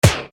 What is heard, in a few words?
BASH SD, Yamaha RY-30